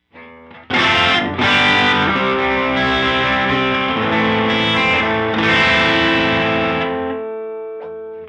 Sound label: Moogerfooger MF 108S CHORUS LFO random